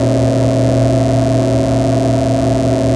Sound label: computer spatial amb loop; spacial loops